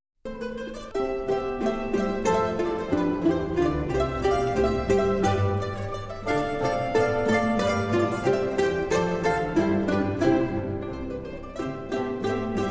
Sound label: gavotte